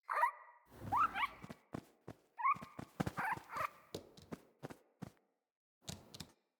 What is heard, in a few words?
Wake Up Tent Hare